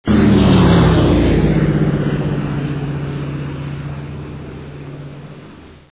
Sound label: c47 dropped